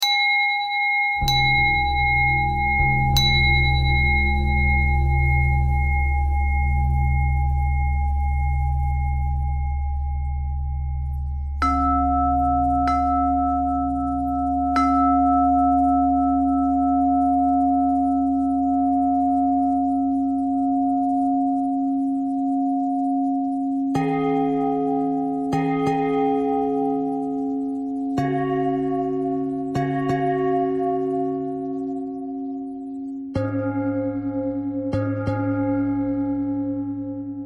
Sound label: bell bell thread simple, bells